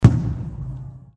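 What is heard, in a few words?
Fireworks Explosion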